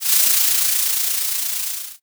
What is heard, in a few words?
frying